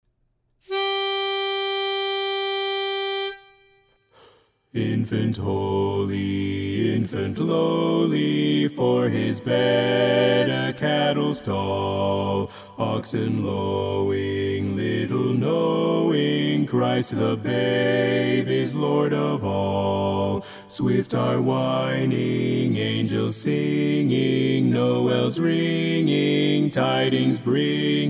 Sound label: BASS, Infant Holy, Infant Lowly